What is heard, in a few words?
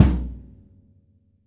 BD, Drums Acoustic; USB Soundscan vol.10; AKAI; 90s sample CD; ALL BD